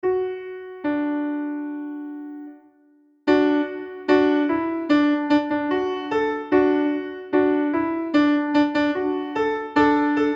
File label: no vocal mix